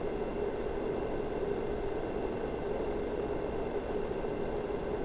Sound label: dohm basic deep